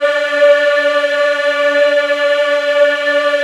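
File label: D4 men women eh, Choir; USB Soundscan vol.28; AKAI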